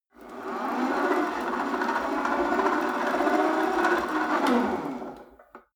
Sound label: rope trainer pull sequence medium, fitnessstudio